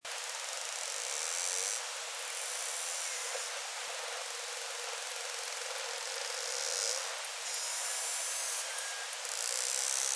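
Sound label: dolphin click burst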